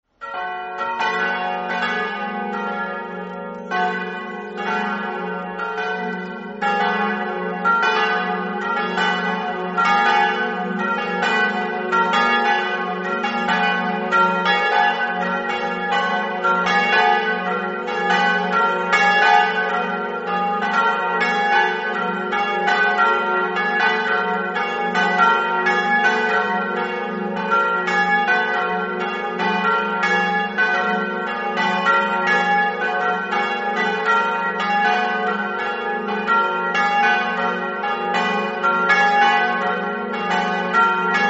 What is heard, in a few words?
campane; rauscedo